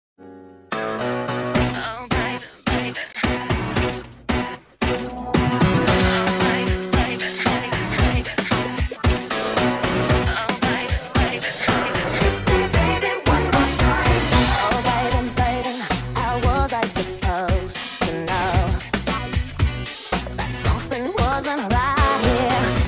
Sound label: Pink Jazz, Sped up